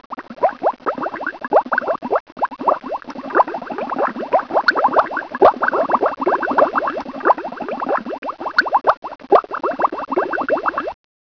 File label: bubble